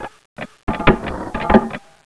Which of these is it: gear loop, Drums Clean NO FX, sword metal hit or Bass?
gear loop